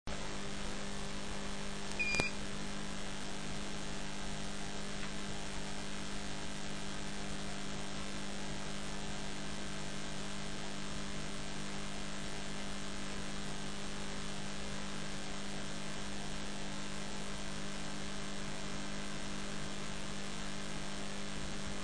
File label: destroy